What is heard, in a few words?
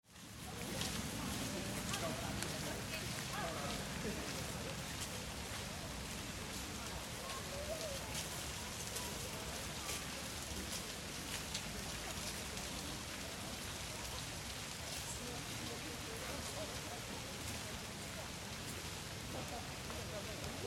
Surround park ambience light Stereo Downmix, Sparse city park ambience; Outdoor Sound Effects; Few people walking by, occasional faint voice of adults an